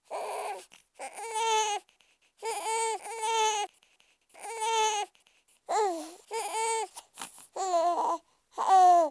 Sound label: baby cry; quake2; inland